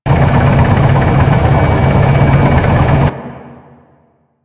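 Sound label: Settlement Turbine Machine; Ambience; Warframe; Grineer Settlement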